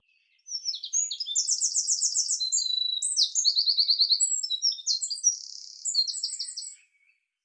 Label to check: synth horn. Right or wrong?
Wrong